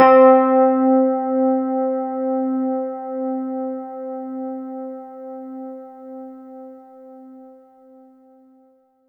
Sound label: C4, synth; 90 s Sample CDs; NiceBeats; SynthLine VOL-23